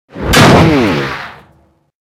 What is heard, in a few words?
150mm gun ricochet